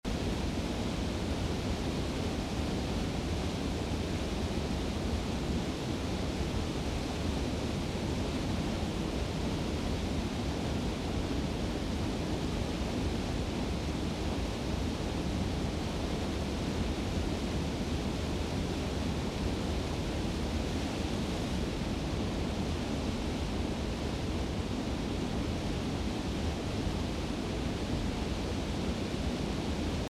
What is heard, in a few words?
bgm